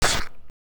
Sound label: Scratch